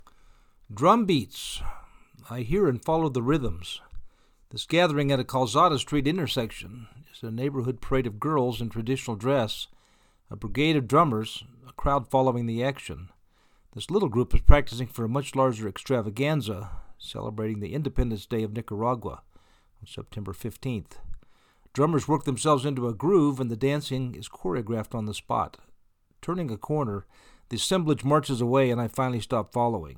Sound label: drumbeats